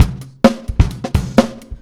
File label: ACOUSTIC, Big Beat Drumloops